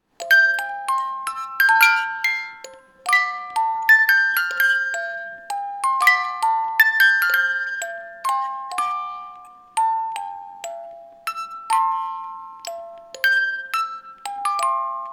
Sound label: Music Box